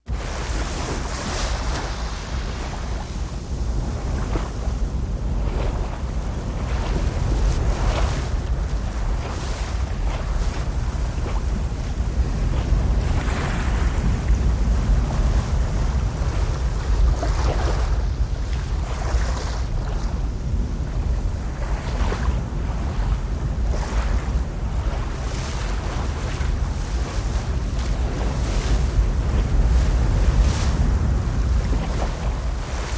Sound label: Water Lap Flight Brid, water lapps